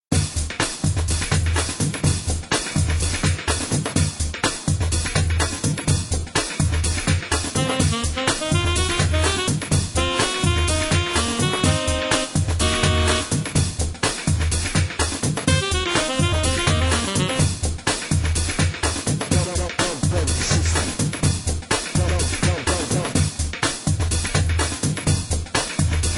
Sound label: rhythm